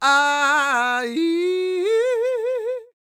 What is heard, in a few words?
CROON, Soul